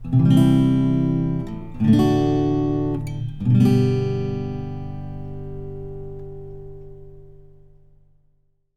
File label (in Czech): kytara